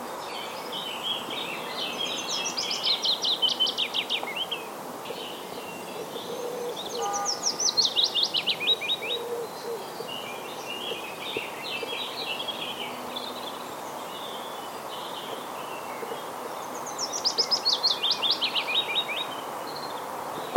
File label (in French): pouillot fitis friche; v evrard